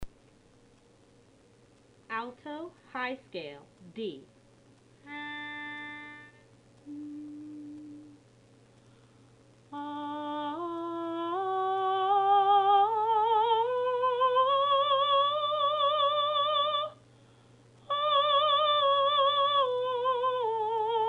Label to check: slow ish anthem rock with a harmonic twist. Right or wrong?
Wrong